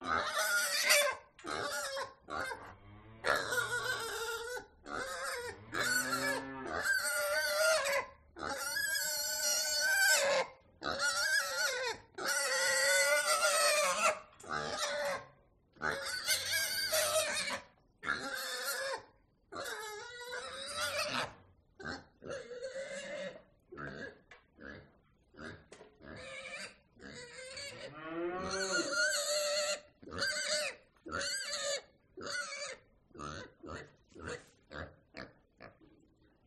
urban farm pig squeals and cow

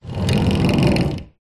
household door wooden rolling screen roll; zapsplat

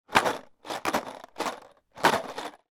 plastic box of drywall screws movement; industrial

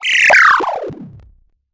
cartoon flutter fall

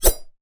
warfare sword swing fast whoosh metal

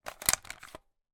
toy nerf gun strongarm cock

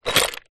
shells small stones plastic bucket short movement; foley

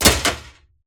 Impacts; Just Impacts; Extension I; soundbits

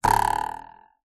cartoon ruler twang metal

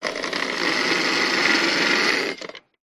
science fiction robot tracks wheels whirr stop

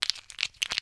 food drink ice cubes x3 movement in hand

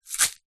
food salt himalayan plastic grinder shake; zapsplat

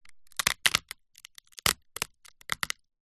crackers squash crack break crush

